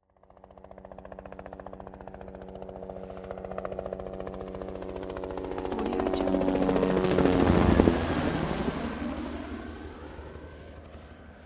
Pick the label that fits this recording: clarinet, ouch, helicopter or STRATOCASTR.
helicopter